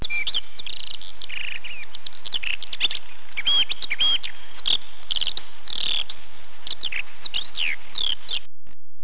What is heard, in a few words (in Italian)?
allodola